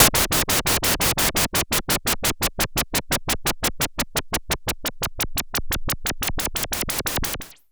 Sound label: Pulse, Filtered Noise; noise hiss crackle